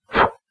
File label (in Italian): rumore soffio